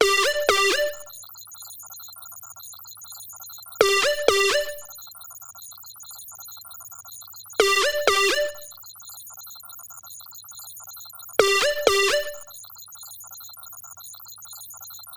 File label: ringtone